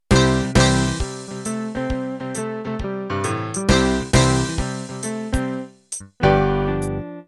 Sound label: Country Shuffle Intro